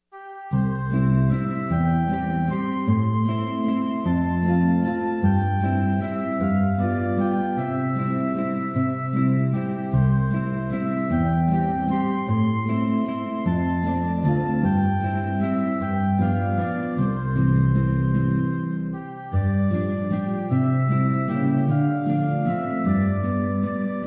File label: flute